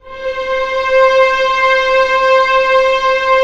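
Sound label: strings C4 PP L; Sound On Sound - Peter Siedleczek's Orchestra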